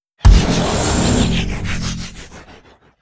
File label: neutron fire layer; apocalypse